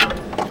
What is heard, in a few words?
METLCATC, ROBOTS; AKAI Anime Sound FX